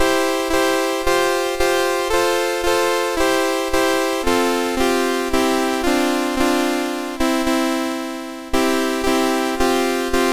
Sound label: Chord Progression Piece, MOS6581R3